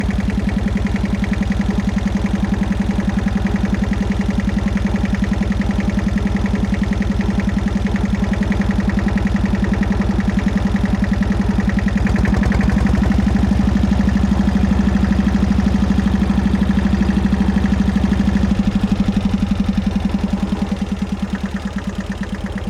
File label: Kramer KL11 Steady RPM Medium Speed To Faster To Slowdown, Oldtimer Tractor (1955); Boom Mic; Sound of Essen